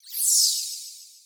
twinkle; deltarune yellow translation

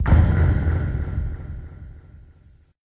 intro noise; deltarune yellow translation

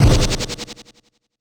flip; deltarune yellow translation

